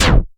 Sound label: Nano Blade